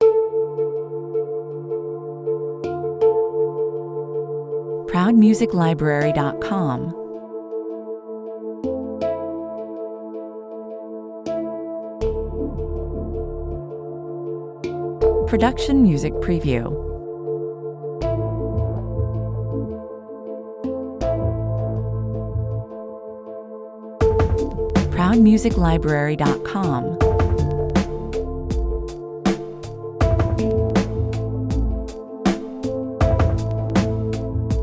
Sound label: hanging beats, 160 bpm